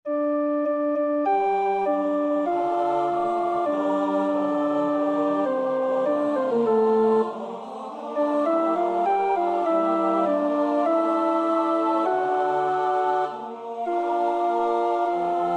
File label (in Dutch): alt, koorpartij oefening; Schütz (Heinrich); Matthäus Passion SWV 479